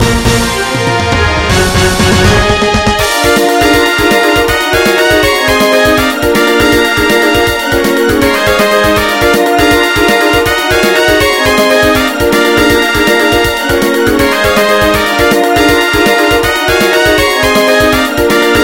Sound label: Fanfare